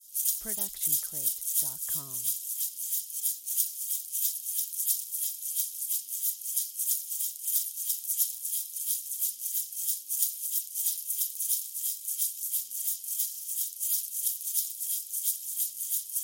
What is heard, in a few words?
egg shaker fast; SoundsCrate